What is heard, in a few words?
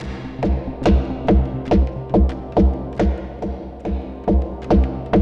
PULSE; Optical Media International; Sonic Images Library; SI FX Vol 4; Instrums